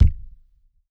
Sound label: Stage Stamp BD, Drum Hits; Aberdeen Music Hall; Music Hall Percussion